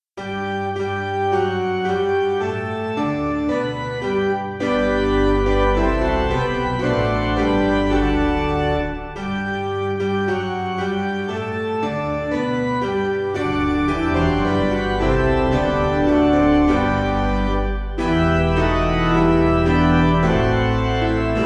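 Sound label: Piano, Music Backing Files for Churches; Roberts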